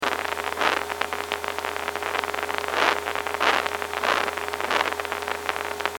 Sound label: Cockroach Spikes